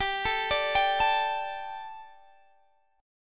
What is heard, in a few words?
G Suspended 2nd, Guitar DADGAD; chordshape; down